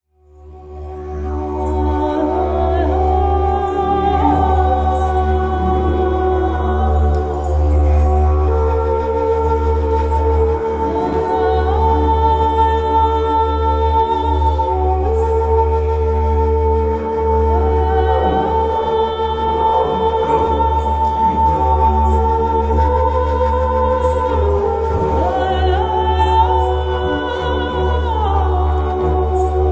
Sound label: didge